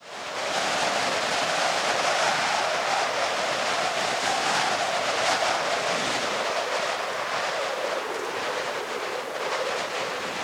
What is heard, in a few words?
Sierr Wind